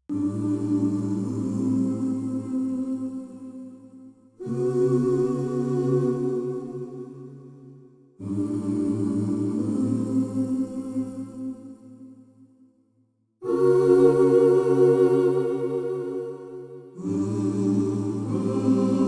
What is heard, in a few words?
Choir; SampleTank 4